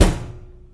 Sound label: hit hard, metal file cabinet; hl2; physics